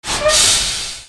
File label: INDUSTRY